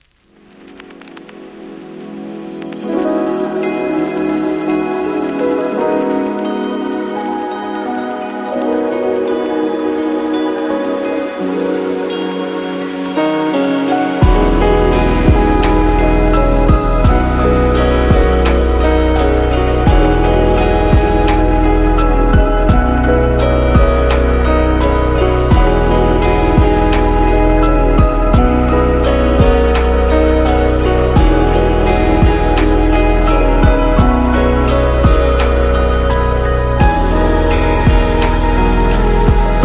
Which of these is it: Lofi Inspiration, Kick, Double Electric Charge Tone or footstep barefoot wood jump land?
Lofi Inspiration